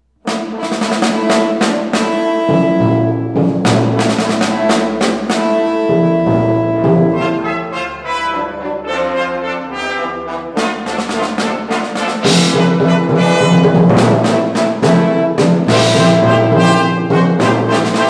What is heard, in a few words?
Brass